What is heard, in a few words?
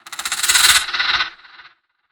Lurker Sniff; Evolve; Gorgon